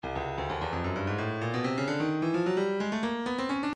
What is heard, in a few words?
breakbeat 130 bpm; Stylus